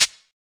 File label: high hat sample B key; hat samples